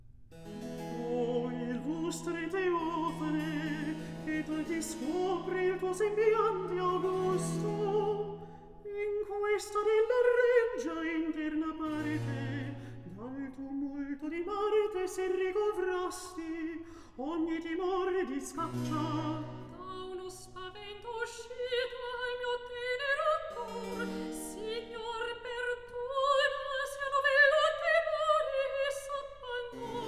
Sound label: Recit; Act II; O illustre Teofane; Handel; Ottone, re di Germania; Ottone, HWV 15